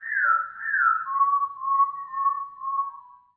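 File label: Special Photo Barcode Forest Environment Bird; viewfinder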